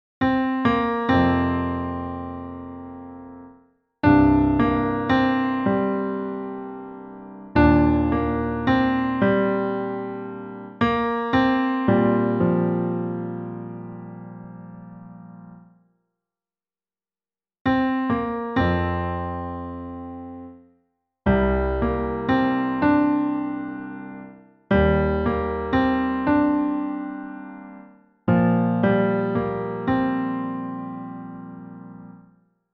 Verse Melody

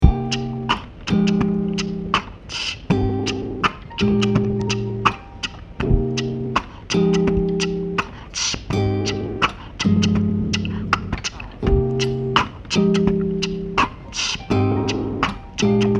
LoFi Spire Pro Beat

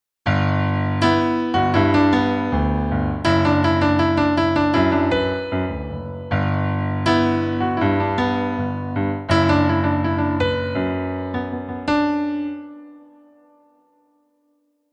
chorus

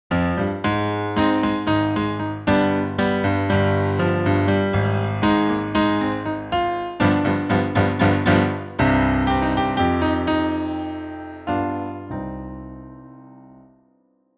c minor

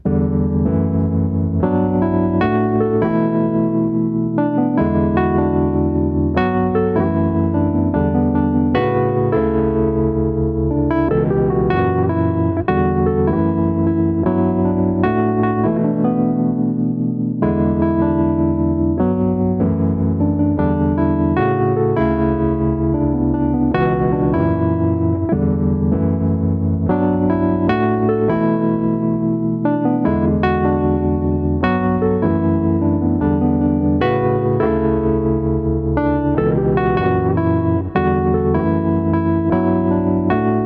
melody

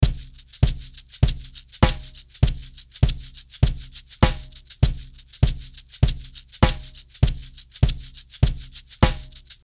Intro Verse

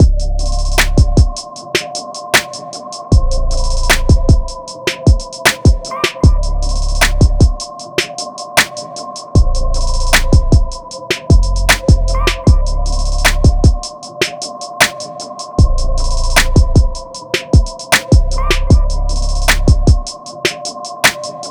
Trap Beat Example

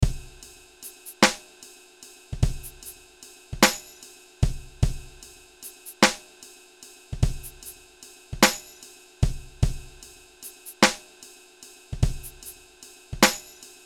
Cabasa Clap Tambo Groove

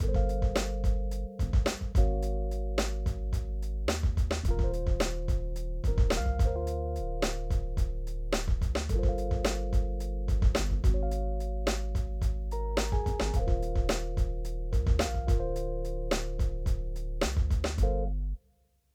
Spacious Bassline

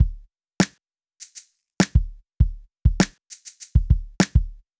Drum Effect Dry

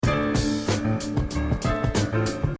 Synthetic Drums Bass Keys

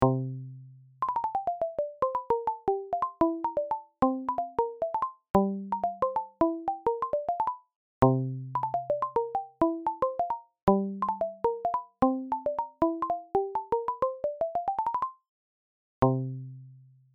Rhythmicon all keys

